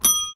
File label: bell